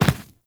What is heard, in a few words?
Medium Land Default, Footsteps; Enemies; Warframe